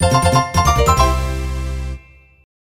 Fanfare - Welcome; galeofdarkness

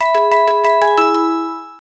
Fanfare - Pokémon Healed; galeofdarkness

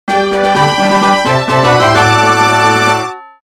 clear!; stadium2

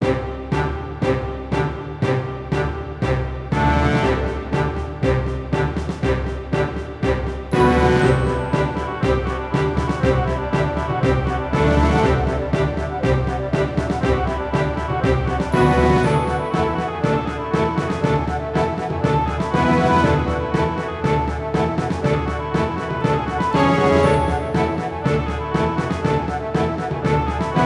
Fanfare - Evolution; galeofdarkness

